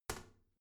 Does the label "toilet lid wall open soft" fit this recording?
Yes